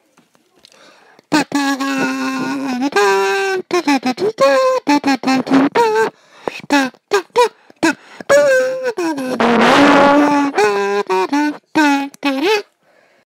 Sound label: kazoo